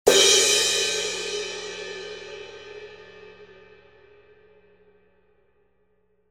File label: 18in Dark Crash Medium Thin, cymbal sound